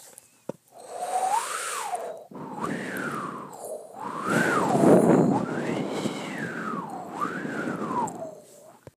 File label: Wind gusts